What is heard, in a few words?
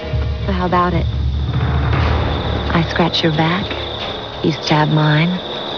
scratch n stab; sacrifice2